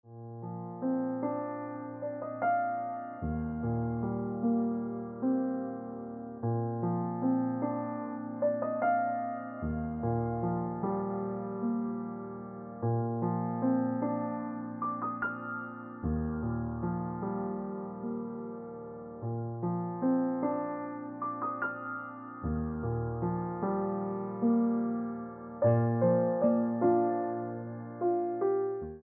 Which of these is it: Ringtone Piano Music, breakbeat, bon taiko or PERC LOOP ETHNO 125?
Ringtone Piano Music